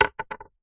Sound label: food sweet candy boiled set down on wood surface